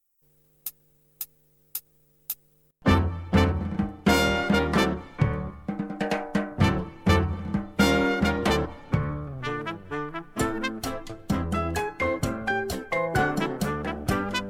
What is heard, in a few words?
Ballroom 40s Cha Cha, G; backing track